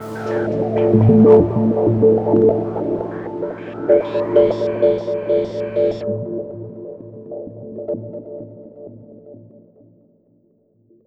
long sci fi sting, A very bizarre sound that reminds me of entering a menacing, yet fascinating, evil